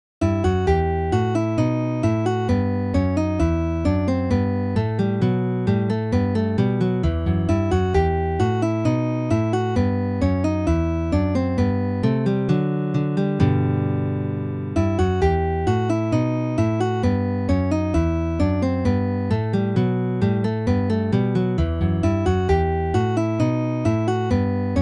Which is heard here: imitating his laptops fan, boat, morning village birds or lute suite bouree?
lute suite bouree